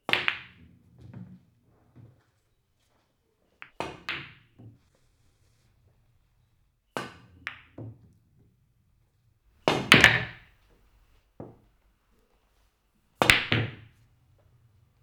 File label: Billiard Pool Shots